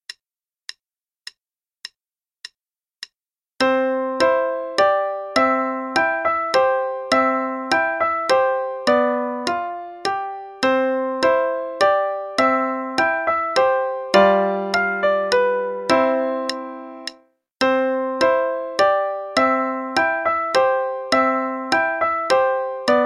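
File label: Play-a-long, Waltz, quarter note=104; Waltz of the Young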